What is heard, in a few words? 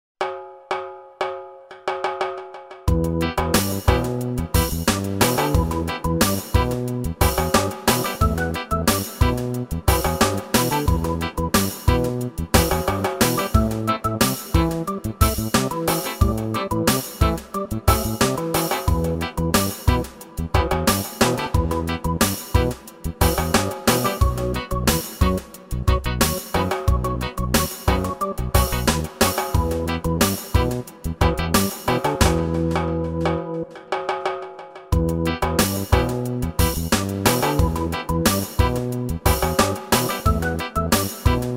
Reggae Man